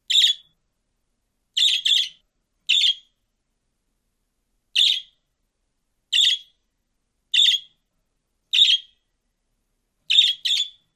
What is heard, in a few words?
calls of captive